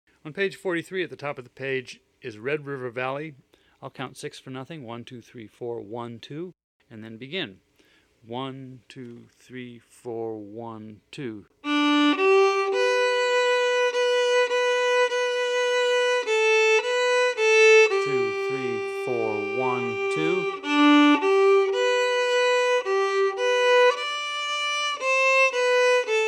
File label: Red River Valley